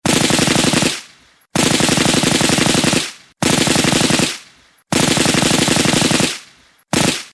machinegun; spasssounds

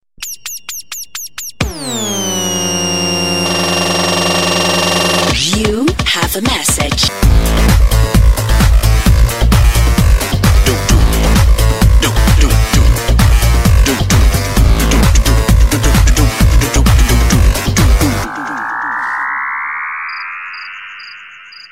sms xpress music

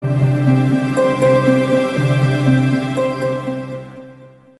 blackberry sms

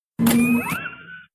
repulsor sound effect; iron man tony stark

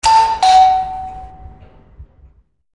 ding dong

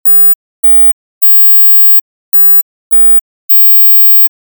teenbuzz

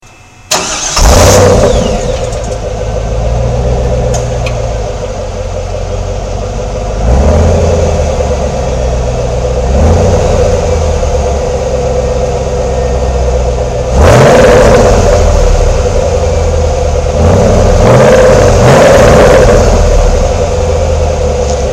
ss camaro engine

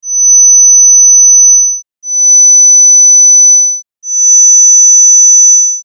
secret ringtone 6khz

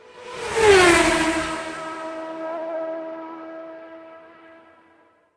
mclaren f1 v8 sound

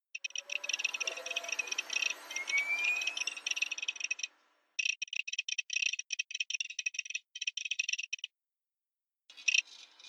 Pip Boy sound; boot sequen; fallout 4

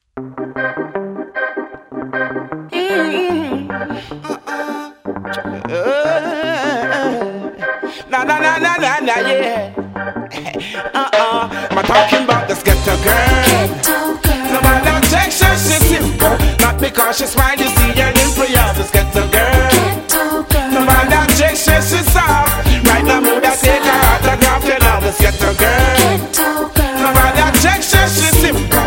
reagge tone; reaggy sounds

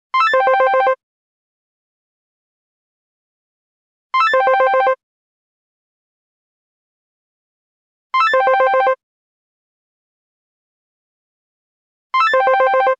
hi to lo

